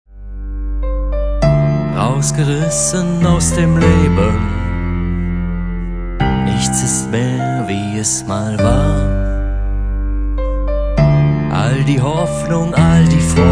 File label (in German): Freund Orchester Version